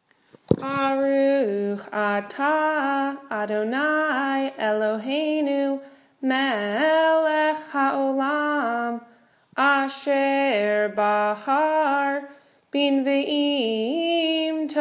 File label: Haftarah Before Blessing